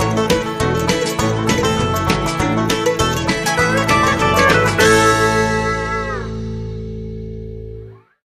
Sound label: Hillbilly Bootstomp; Tag